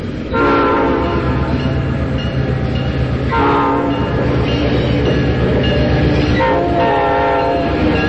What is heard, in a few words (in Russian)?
poezd proezzhaet peregon